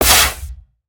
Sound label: Barrier Shock, ambience spaceship